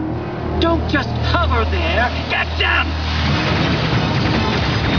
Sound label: hover